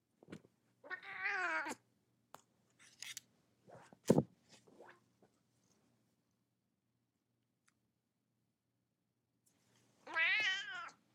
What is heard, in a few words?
purr; Cleopatra